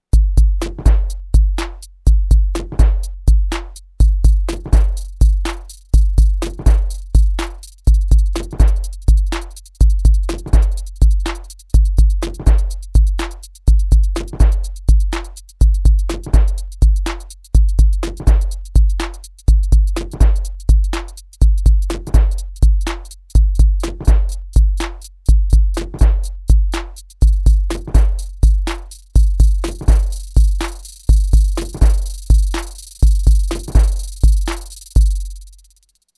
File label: Delay Hihat; Volca